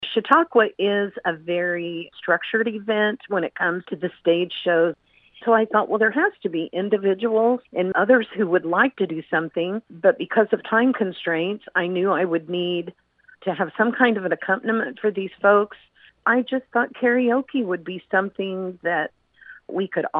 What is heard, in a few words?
Karaoke Contest